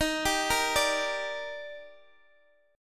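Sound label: E flat Major 7th; x,x,13,12,11,10; arpeggiated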